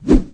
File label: airbone melee